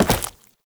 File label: Step Flesh, Foley; Warframe; Oraxia